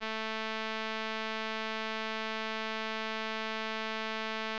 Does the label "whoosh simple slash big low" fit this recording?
No